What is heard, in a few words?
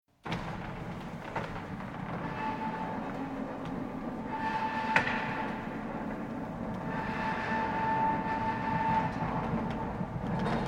Industrial Mechanical Electric Door Large Close Perspective External Closed; Metal Door